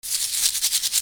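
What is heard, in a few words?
percussion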